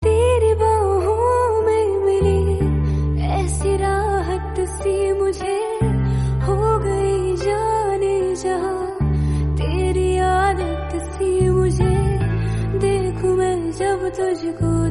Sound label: slowed reverb